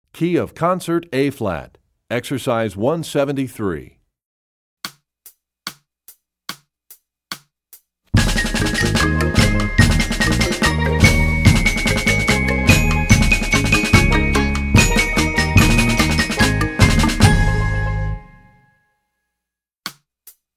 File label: Concert Ab, Percussion; Rubank Studies; EE2000 Book2